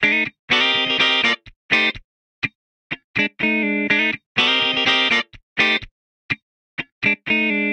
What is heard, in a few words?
Guitar Funk Cmaj 124bpm; Guitar and Bass; Ableton; Factory Packs